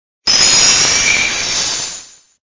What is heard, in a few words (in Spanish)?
Grito; Frosmoth; Gritos de Pokémon de la octava generación